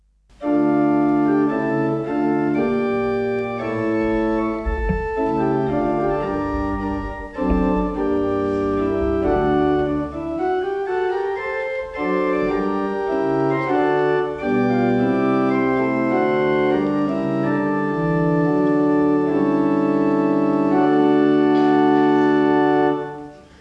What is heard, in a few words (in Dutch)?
combinatie prestant en octaaf; assen